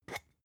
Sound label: spice jar scratch tonal open fast